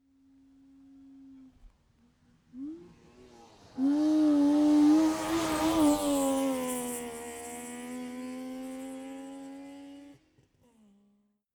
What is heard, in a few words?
car saab 92 exterior pass right to left